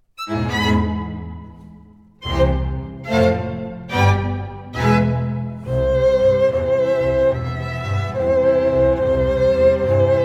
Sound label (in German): streichquintett fadeout